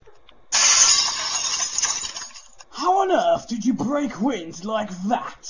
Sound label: Brake Wind